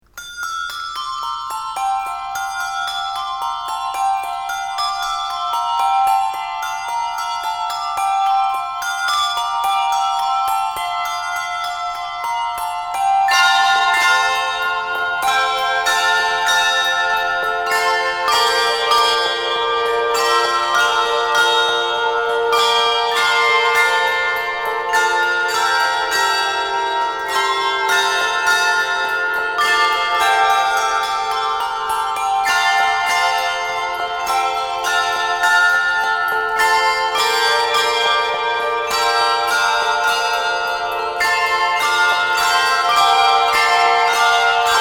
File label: ringing clear